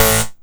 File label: Synth Hit A